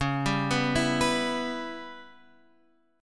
Db Minor 7th Flat 5th, Guitar, Half Step Down

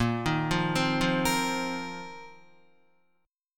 Bb Suspended 2nd Suspended 4th, Guitar; Bbsus2sus4 arpeggiated; JGuitar

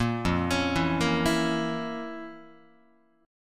F Major 7th Suspended 4th Sharp 5th F, Guitar; FM7sus4#5 arpeggiated; JGuitar

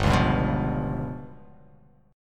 G 7th Flat 5th

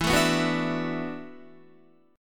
E 9th Suspended 4th E down Guitar; E9sus4 strummed; JGuitar

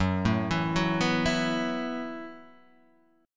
F sharp Minor 11th

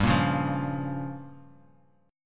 G Minor 7th Flat 5th, Bass Standard

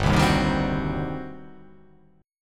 G Major 7th Suspended 4th Sharp 5th, G, down

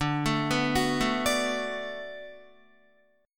D 6th D down Guitar, chord

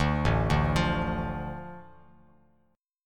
A Suspended 4th Sharp 5th, Bass Standard; Asus4#5 arpeggiated; JGuitar

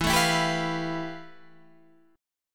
F Major Sharp 11th E

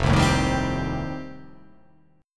F 9th F, chord

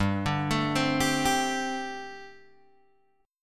G Suspended 2nd Suspended 4th G, Guitar, Open G

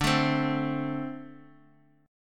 A Minor Double Flat 5th

